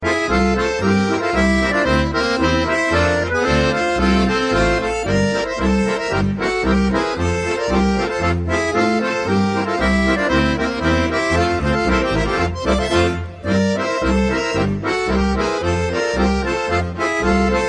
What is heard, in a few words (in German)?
schottisch